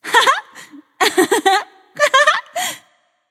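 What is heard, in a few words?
nonverbal yay; smite; voicepack; izanami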